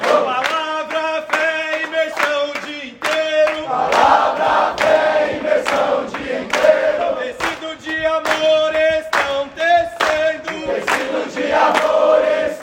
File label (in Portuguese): Grito de Guerra